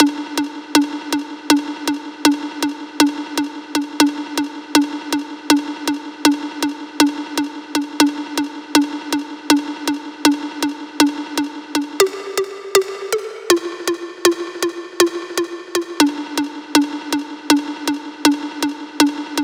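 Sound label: Pluck, 060, D minor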